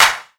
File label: Clap; pitchglitch